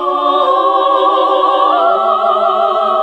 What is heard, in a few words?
SING, CLASSIC VOX; Best Service; Hallelujah